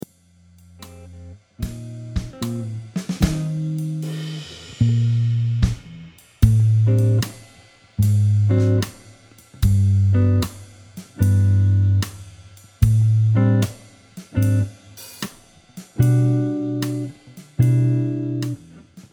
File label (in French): moonlight comping, guitare jazz